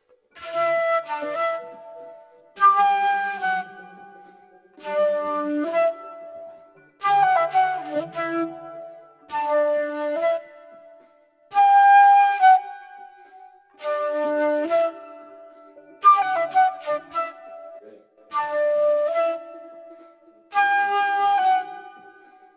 Flight Flute

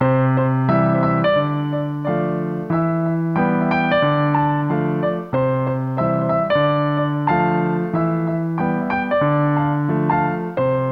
Piano Theme - Jazzy Delay Loopable; Jazzig, munter und fidel. Fröhliche Piano Melodie. Voller Schwung. Film Soundtrack.; Piano Cora Theme; Jazzy V1

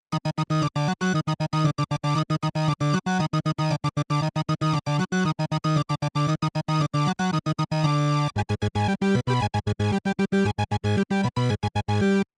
Game Ultrafast Laser Shooter Theme; 8bit gaming schnell; retro gaming

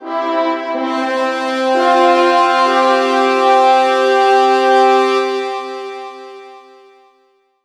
Fanfare Jingle

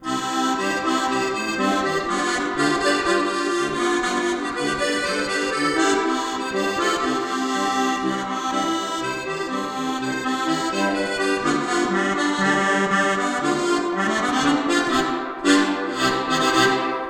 Delay

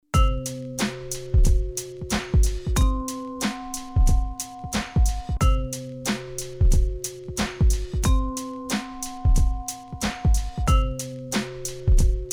Jingle Loop

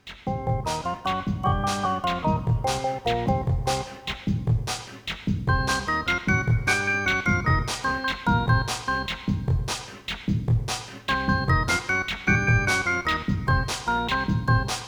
Crime Loop - Jingle

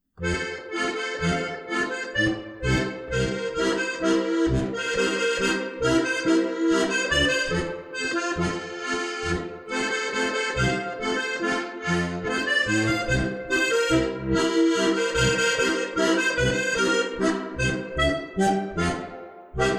Walz

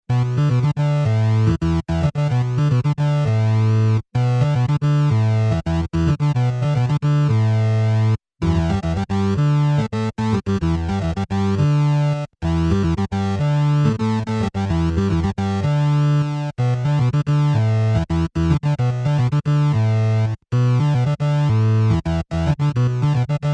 Game Faster Hippie Beatnix Alternating Theme

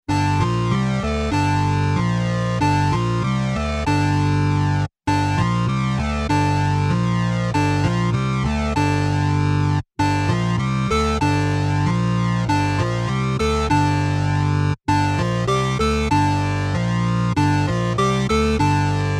Game Faster Dark Walking Loop, 8bit music; 8 bit Gaming Musik; Tempo: schnell; retro gaming

